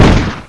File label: pistol